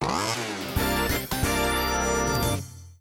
home menu icon

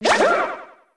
big goomba dead

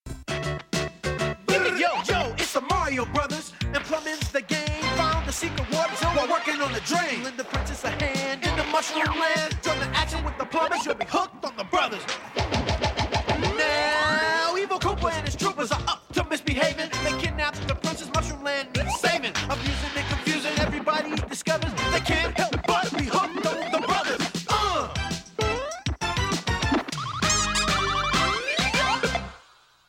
intro cartoon; smbss

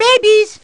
babies; mario

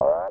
froggy jump; prince; smw2

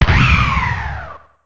cannon shoot

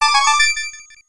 red coin

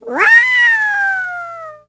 flutter jump; yoshi; smsunshine

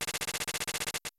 fang flies; smw2

